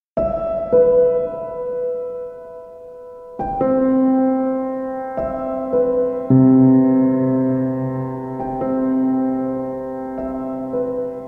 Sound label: Piano Sounds